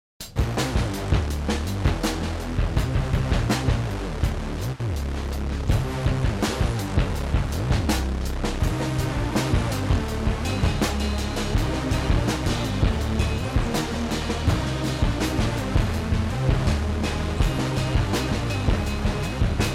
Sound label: Drum Sketch